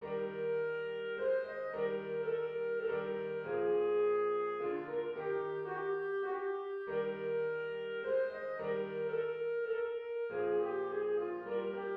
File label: german dance eb major; haydn joseph